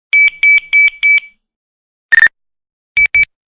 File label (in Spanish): nextel notificacion